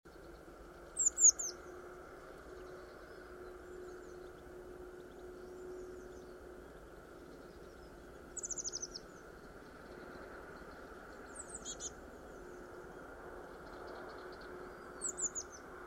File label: Cyanistes caeruleus; SETE